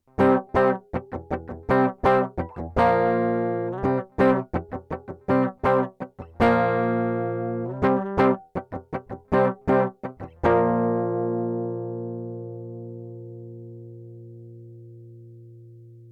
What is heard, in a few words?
guitar rock riff processed